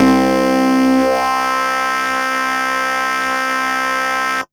single-synth, techno, c; Techno ID

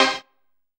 HOUSE, House Dance

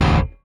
Rickenbacker Bass; Airwindows; Rick-Xtras

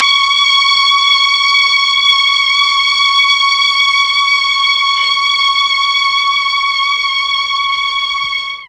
C#4 16 VIOLI, Mellotron; Mike Pinder - Mellotron Archive CD

